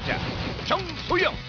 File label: contestant info; korea